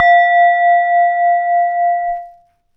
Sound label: Hard Strike Short, Small Bowl, Tibetan Singing Bowls; Percussion Samples